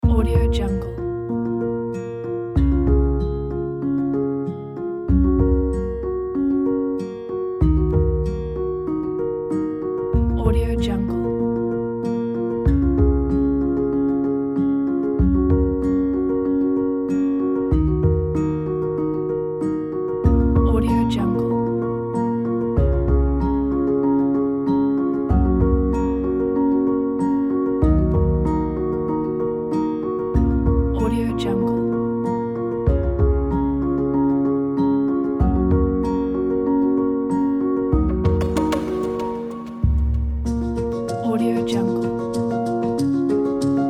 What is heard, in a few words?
piano cinematic acoustic